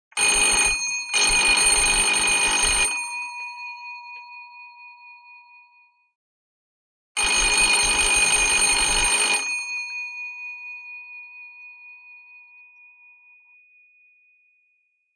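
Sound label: Door Buzzer Household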